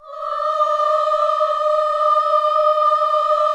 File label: KIDS CHOIR, Gospel